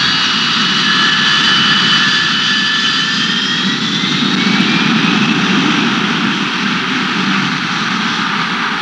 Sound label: JET FTRL, Aerei; trasporti